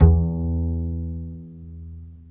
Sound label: upright bass e3; Upright Bass Scale